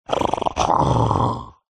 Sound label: horror yeti monster growl snarl; zapsplat